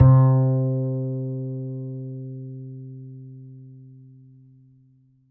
Upright Acoustic Bass; 1ST POSITION; Larry Seyer

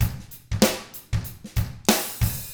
95 FUNK, Drums; ILIO; Double Platinum Drums 1

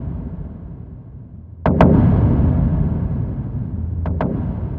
100 Perc Taiko; Zero-G; Future Wave

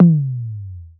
GROOVE TOM, Drums Dance & Groove; USB Soundscan vol.11; AKAI; GROOV KIT

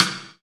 WET SIDE, Drum Mashines, SR-16 DRUMS; EdgeSounds

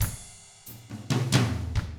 Double Platinum Drums, COUNTY

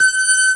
B3 G#5, ORGAN; VOCAL ORGAN; ORGAN #1

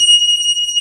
B3 G 5, organ; VOCAL ORGAN

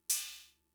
Cymbal; Fricke MFB-522

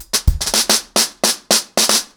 110BPM, acoustic drums, drumloops

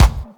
Dance Drums

